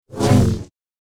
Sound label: Double Bladed Saber Sound; FXHome